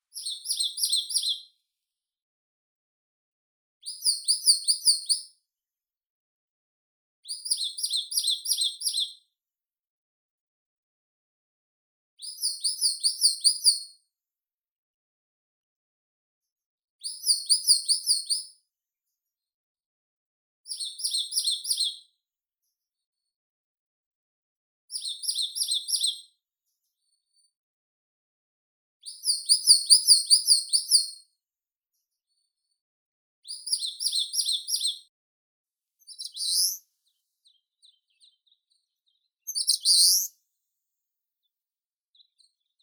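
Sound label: pale-crowned leaf warbler, Phylloscopus coronatus; penochka svetlogolovaya; zvuki prirodi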